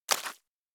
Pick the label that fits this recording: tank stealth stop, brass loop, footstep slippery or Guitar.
footstep slippery